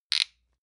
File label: lotion lid open hard; friseur; berufe